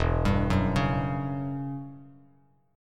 E Minor 6th Add 9th E down Bass Standard

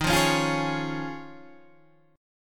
D sharp 7th Suspended 2nd Suspended 4th; D#7sus2sus4 strummed

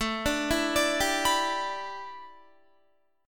A 7th Suspended 2nd Suspended 4th Guitar; A7sus2sus4 arpeggiated

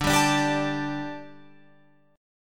D Suspended 4th D, Guitar, Open F; Dsus4; strummed; 14,14,14,14,17,16

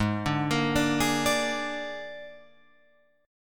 G sharp Suspended 2nd Flat 5th, Guitar Open F; G#sus2b5; arpeggiated; JGuitar

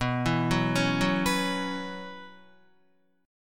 B Suspended 2nd Suspended 4th, Guitar; Bsus2sus4 arpeggiated; 6,7,6,6,6,7; 4F,4A,5C,5G,5C,6E; JGuitar

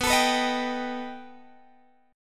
B Augmented 7th B down Guitar Open G

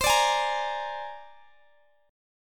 C 7th Suspended 2nd Sharp 5th, Guitar, Open G

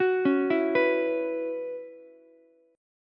B Suspended 2nd Suspended 4th, Ukulele; Bsus2sus4 arpeggiated; JGuitar

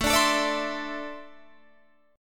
A Suspended 4th Flat 3rd A; A4-3 strummed

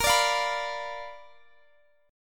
B Minor Major 7th Flat 5th, chord

